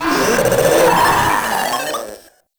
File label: Proto Infested Runner Vocal Attack; Warframe